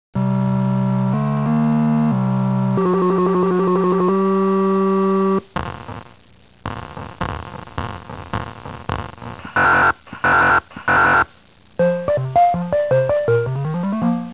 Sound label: stomp